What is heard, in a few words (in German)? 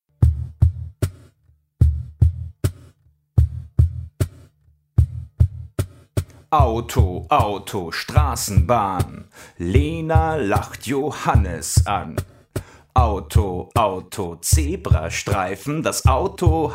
Rap Auto Auto Strassenbahn